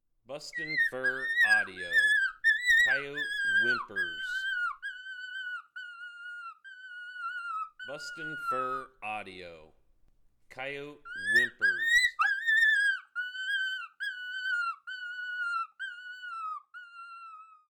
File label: Coyote Whimpers